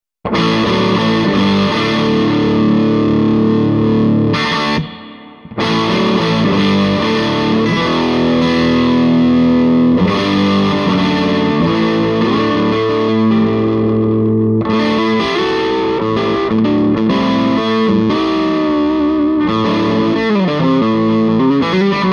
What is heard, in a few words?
SG Grind; Swart 30 EL34; Gibson SG-Grind; super 30